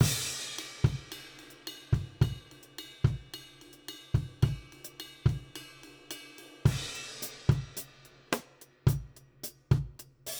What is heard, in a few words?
Country Drums Breakdown; Digidesign; Pro Tools Essential Loops